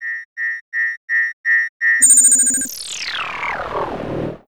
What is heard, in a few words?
focus fire